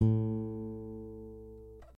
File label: string5 a2